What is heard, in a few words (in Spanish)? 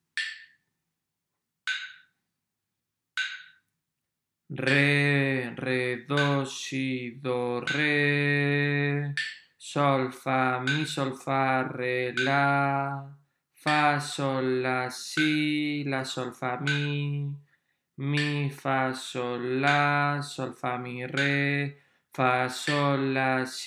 RITMO